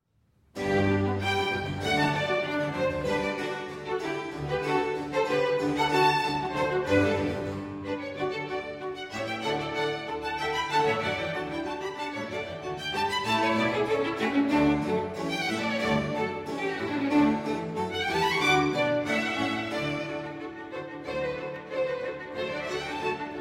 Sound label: Concerto For Oboe and Bassoon in G Major Andante Molto